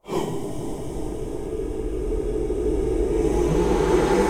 combo Anticipation; pyramid

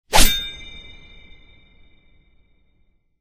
knife; cashnoire

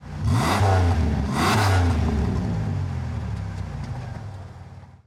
Car Engine; knight rider

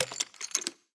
button clear; american roulette; americanroulette3

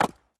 symbol Stop On Stone; eldorado

